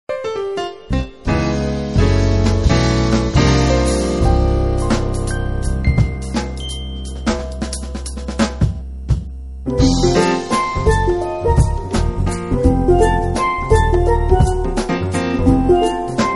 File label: blues